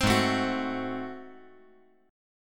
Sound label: Ab 7th Flat 9th, chord; Ab7b9 strummed; JGuitar